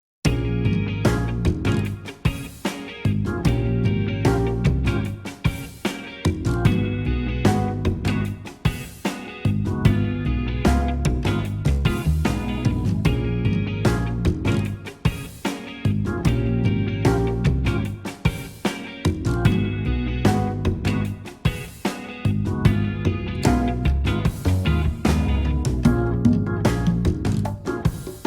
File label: Substory Music; yakuza kiwami unreleased songs ps4 gamerip 2019